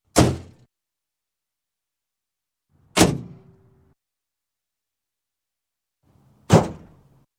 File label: Car Hood x 3; Sound Ideas; Series 2000